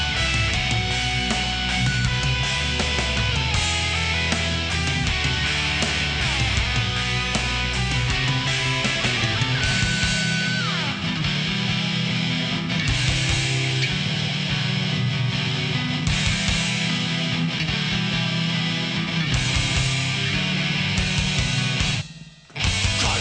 metal, music genre

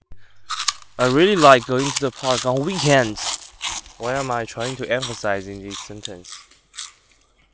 Munching

